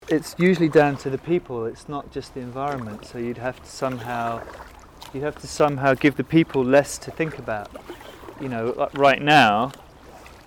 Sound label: stop clock; kayaklibre; 2011 Birmingham